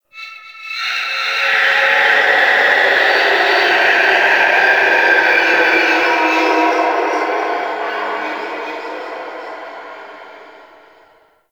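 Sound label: Shipyards Distant Cry, Ambience; Warframe; Grineer Shipyards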